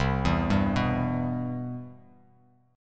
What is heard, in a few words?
C Major 7th, Bass Standard; CM7 arpeggiated; JGuitar